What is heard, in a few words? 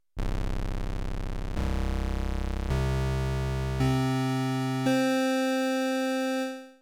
sub osc, Kawai sx240